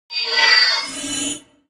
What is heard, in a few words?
sphere socket eject; Source Engine; Portal 2